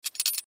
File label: Potato Peeler Pick Up; Food; Foley; Blastwave